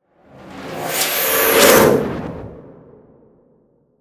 Dimension, Portal, Suction, Science Fiction, 5.1

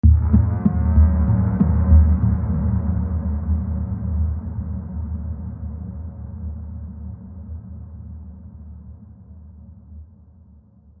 Hit Multiple, Hit Multiple Ascend Deep Scary; Blastwave

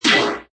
Blast Warble Fast, Scifi; Scifi Production Elements, Set 2; Science Fiction Sound Effects; Lynne Music

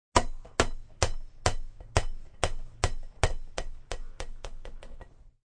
Inflated child's ball bounces; Bouncing Ball; Cartoons

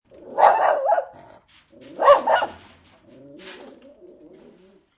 Vicious Dog; Vicious mean dog barking and growling behind a metal gate - variation 3; Dogs; Animals

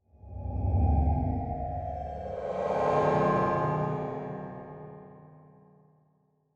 Flange Feedback Sweep Front To Rear To Front; 5.1 STEREO MIX; Blastwave

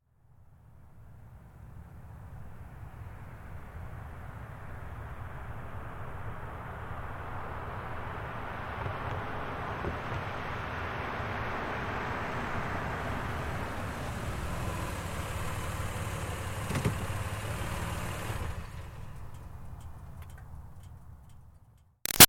Car Highlander Up Stop Slow; 2005 Toyota Highlander V6; Cars; Vehicles

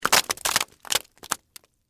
Splat Debris, Juicy, Long; Impact; Blood And Guts Sound Effects; Blood and Guts (Sound Effects:Horror); BlastWave

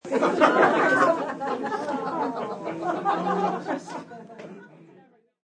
Crwd Lgh, A crowd laughs; Laughter

